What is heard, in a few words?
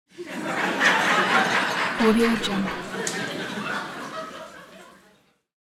club crowd laugh